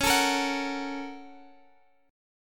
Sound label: Bb Minor Major 7th Sharp 5th